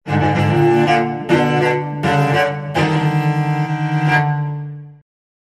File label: Cello Danger Eerie